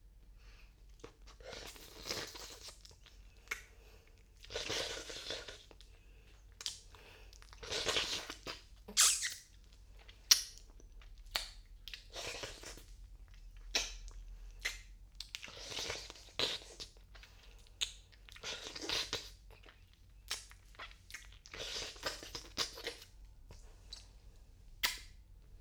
別撮りチュパ音, バイノーラル; 別撮りチュパ音2

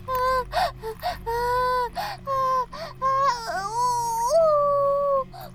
バイブ放置, ASMR

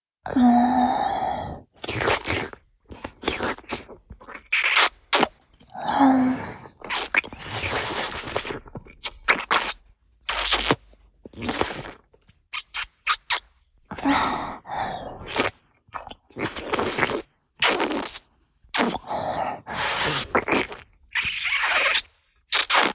お掃除フェラ(弱):腹部や陰茎に垂れた精液を舐める・吸う, チュパ音; チュパ音抜粋集

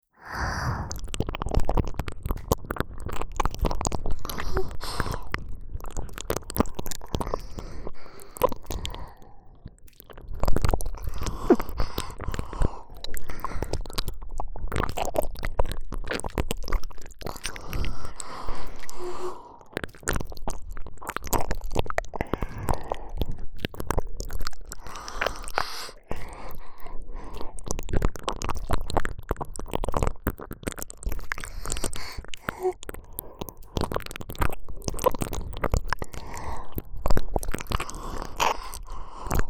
ダブル耳舐め; 幼馴染と青春ぜんぶ入りせっくす！

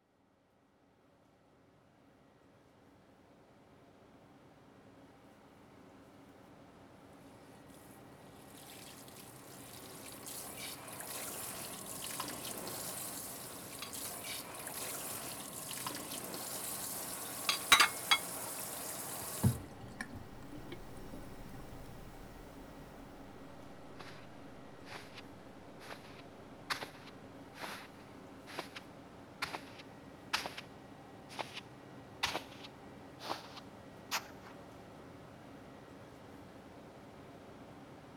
顔と首の水星マッサージ、まる。（吐息）, ASMR

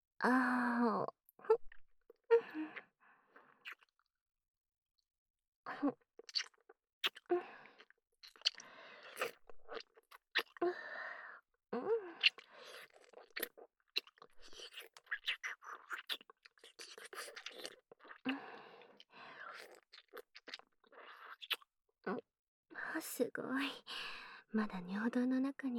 お耳のお掃除～耳かき 梵天 炭酸, ASMR

